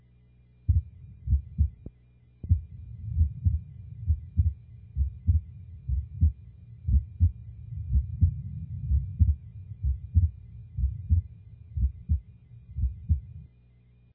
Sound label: Normal sounds